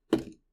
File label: bounce; shweets sim